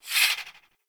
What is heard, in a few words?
Swing, Weapons Melee; Warframe; Modular Melee